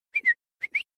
Ranger Whistles

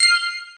Notification; KOTOR

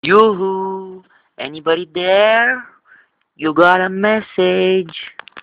Yooohooo

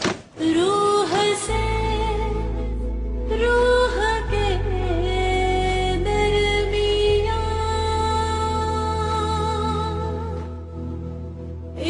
female, ringtone; blues

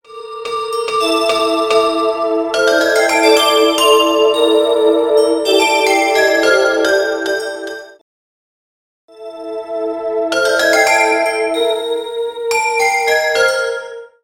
nokia soft mix

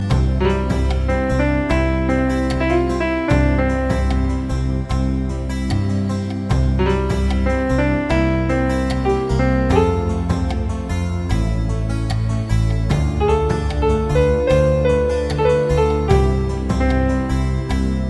elegant piano; classical

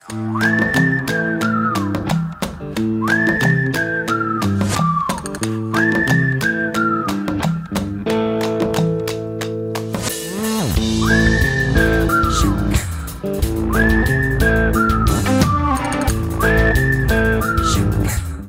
whistle

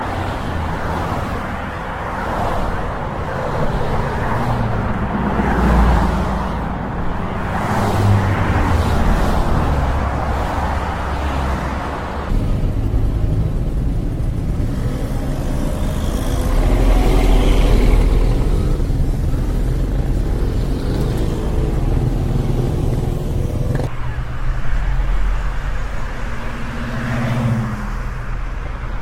Traffic Sounds

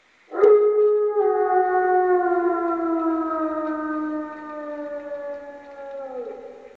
Alpha Wolf

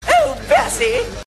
musical

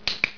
sms click; ringtones

